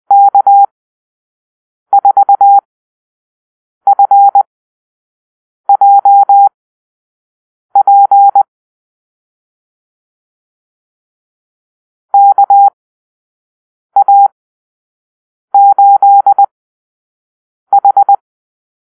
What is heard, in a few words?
Call-Sign Drill, morsecode